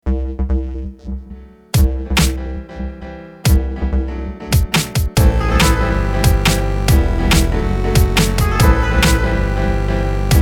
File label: Jingle